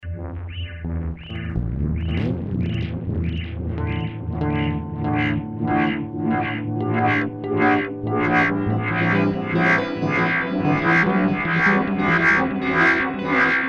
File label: Guitar